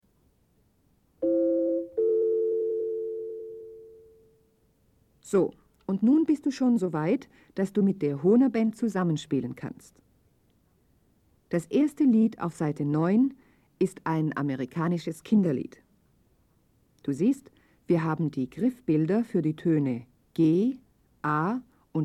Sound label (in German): Spiel Blockfloete